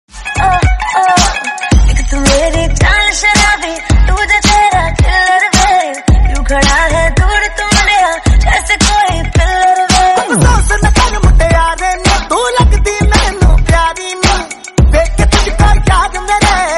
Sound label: bollywood song tone ringtone